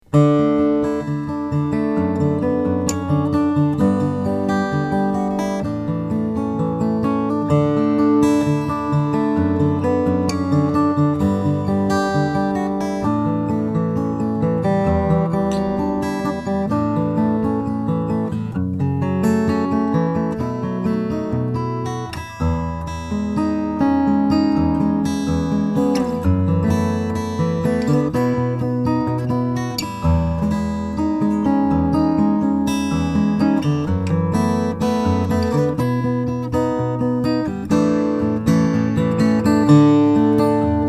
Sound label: taylor 814ce indian rosewood